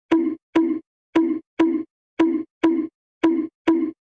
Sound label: bing bon bonk